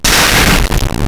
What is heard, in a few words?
Scala, Impulse Responses, Front Left; Ambiovolver